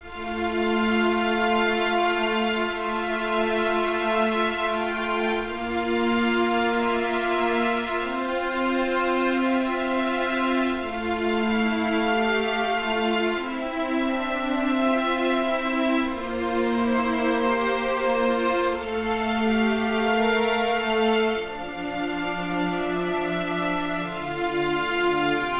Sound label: sad orchestral bgm